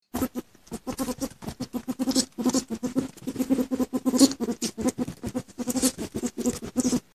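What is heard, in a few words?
mink voice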